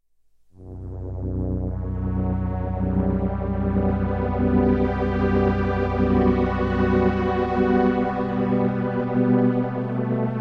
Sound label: string ensemble stereo, triple chorus